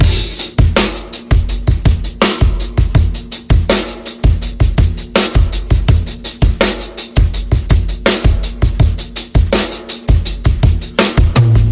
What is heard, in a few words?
082 roots drum loop